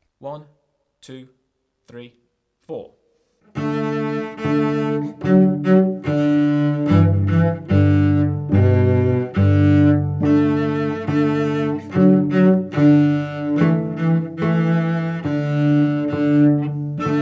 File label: someone plucks someone bow cello